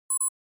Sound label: Flash Beep Dbl Elect